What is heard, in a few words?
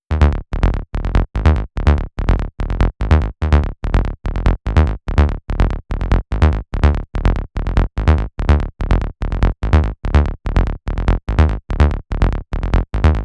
Bass Dark, PsyTrance; LiLA'c Records PsyTrance Sounds 01